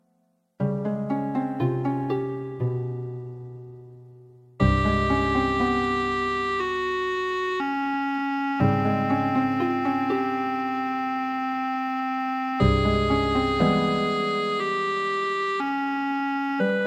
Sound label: Clarinet